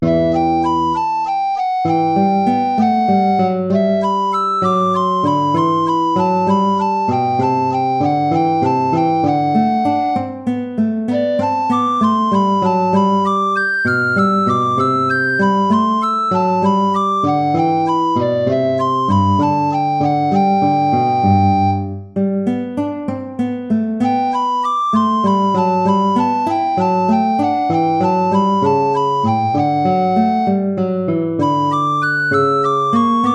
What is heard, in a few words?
gigue